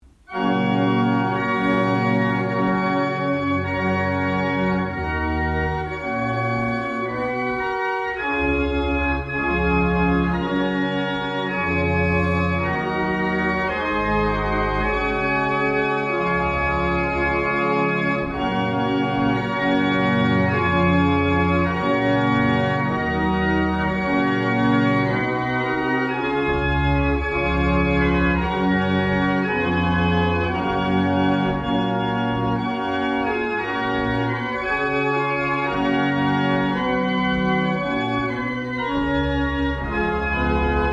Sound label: barrel organs; Brightling